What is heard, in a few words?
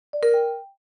Notification alert